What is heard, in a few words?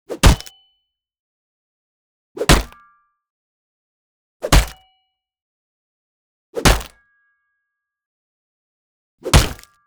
MELEE - DESIGNED - BASEBALL BAT Aluminum Hit Head; Rock The Speakerbox